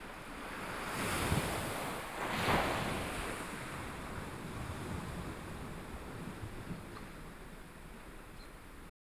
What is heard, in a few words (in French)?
gingle vague